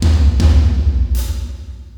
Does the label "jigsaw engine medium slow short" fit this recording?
No